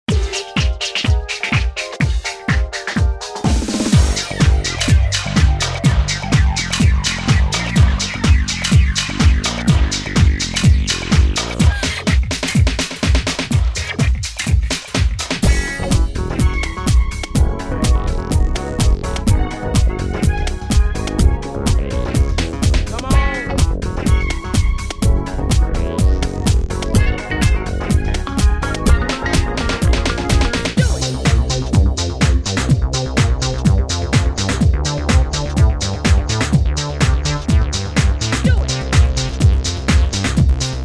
house; xp-80; roland; House Collection demo song; roland demo cd-3

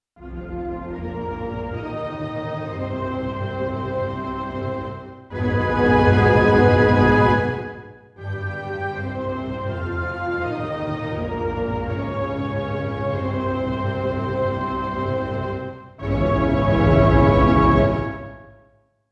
Temolo; xp-80; roland; roland demo cd-3

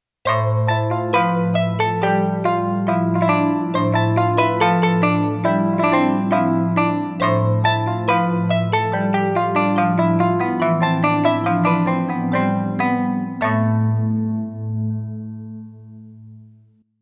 Asian Scene; xp-80; roland; patch demo; roland demo cd-3

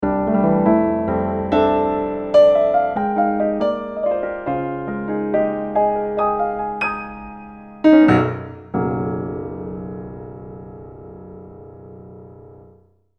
Dark Grand; fantom-x8; patch demo

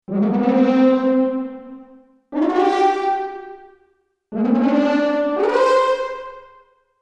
F. Horn Rip; xp-80; roland; roland demo cd-3

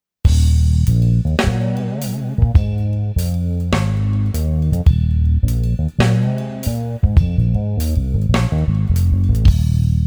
bass and drums; Multi-patch demo 7; xp-80; roland demo cd

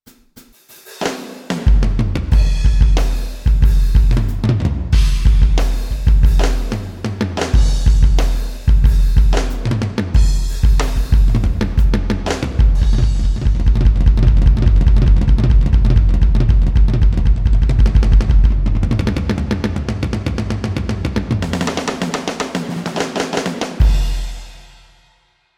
Big Rock KIT; xp-80; roland; BigRock1 KIT patch demo; roland demo cd-3

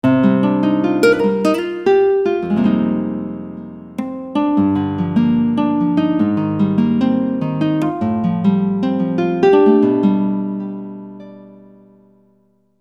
Nylon 4way; fantom-x8; patch demo

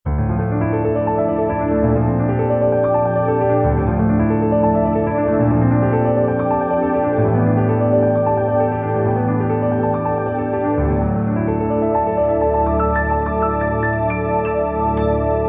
Syn Pad Grand; patch demo; 16-bit, 44.1 kHz stereo; xp-80; roland; roland demo cd-3

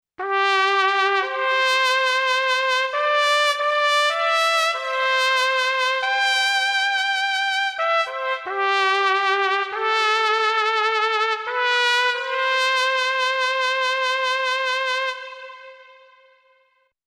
Sad Trumpet; patch demo; 16-bit, 44.1 kHz stereo; xp-80; roland; roland demo cd-3

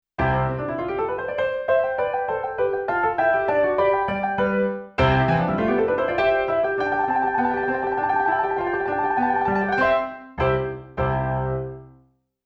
Grand Piano; xp-80; roland; roland demo cd-3

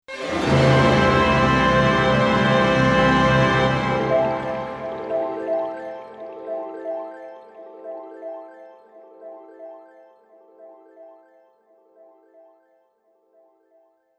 Orch End FX; xp-80; roland; roland demo cd-3

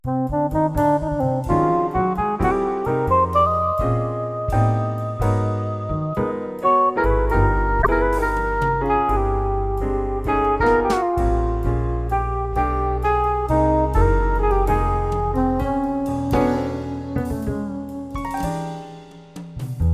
XV-5080 jazz; jazz poet

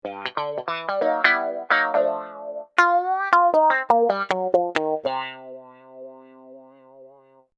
Cut Thru Wah; fantom-x8; patch demo

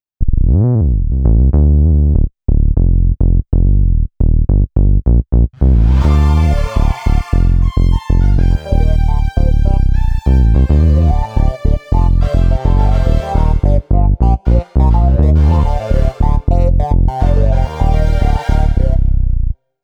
vintage synth; xp-80; roland; Multi-patch demo; roland demo cd-3

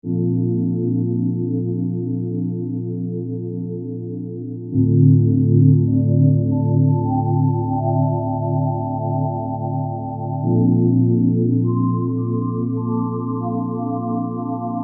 Warm Glow; fantom-x8

